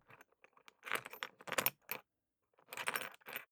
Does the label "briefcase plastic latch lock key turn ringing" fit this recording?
Yes